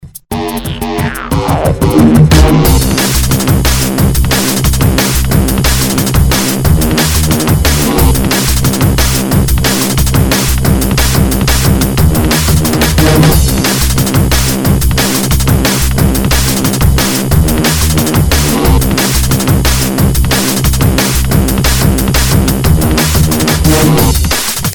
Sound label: Drum and bass